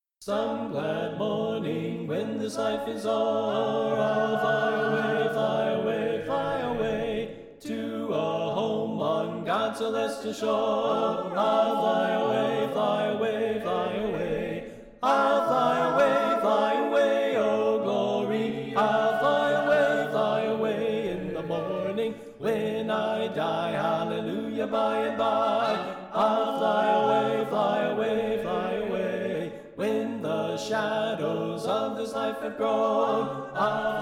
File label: SATB Tenor Left